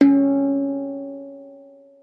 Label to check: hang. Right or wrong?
Right